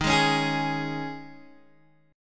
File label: D sharp 7th Suspended 4th D sharp, Guitar Open G